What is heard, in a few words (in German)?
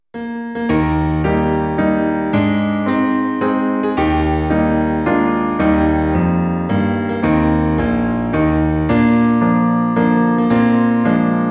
Zum Geburtstag viel Glueck in Eb Piano